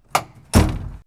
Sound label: Pwr FX Slammin'; X-Static Goldmine